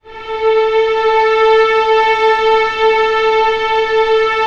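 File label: STR A3 PP, Orchestra Strings; Sound On Sound - Peter Siedleczek's Orchestra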